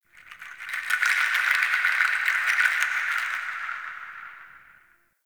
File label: Wood Chimes; Warframe; Nokko Colony; Ambience